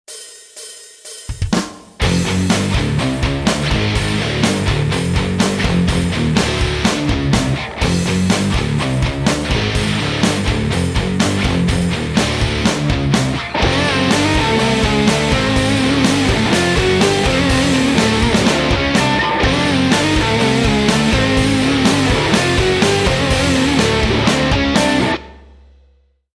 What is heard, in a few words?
drop D